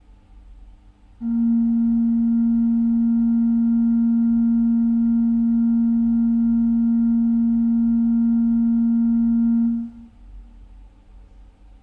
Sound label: quinte; orgue; Positif; Ce petit orgue